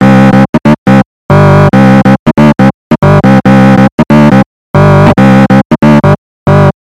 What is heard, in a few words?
Distorted Organ